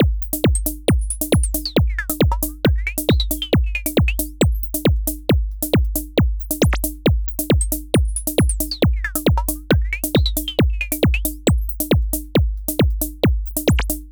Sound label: Anabeat Mix, Beats